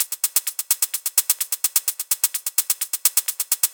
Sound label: Drumloops 128BPM